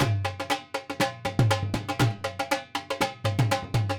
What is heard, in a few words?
Dhol